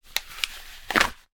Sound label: glove rubber pull off; foley